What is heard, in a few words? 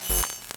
win loop; eggomatic